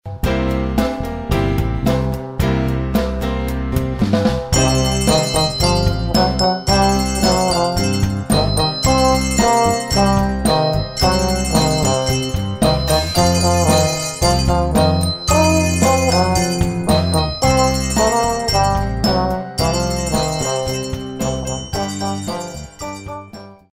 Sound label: Blues